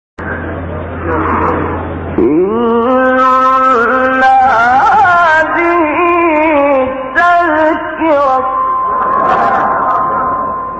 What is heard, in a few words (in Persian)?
تلاوت زیبای آیه سوره; 29 30; انسان; استاد اللیثی